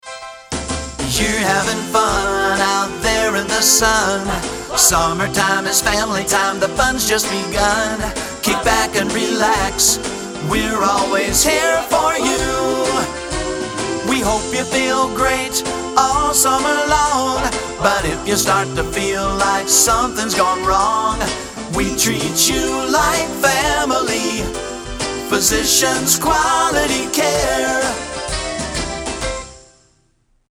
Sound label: summer jingle